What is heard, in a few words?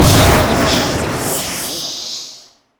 boom; spiritfire; LoL